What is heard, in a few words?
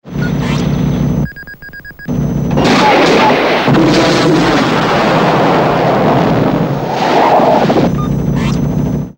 Helmet Visor Fire then Up; Airwolf